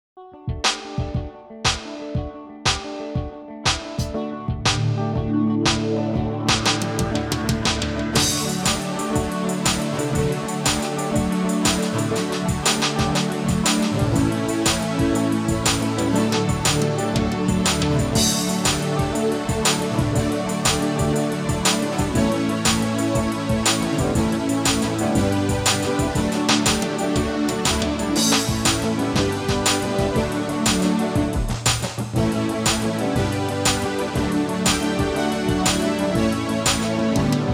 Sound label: Irish 120 bpm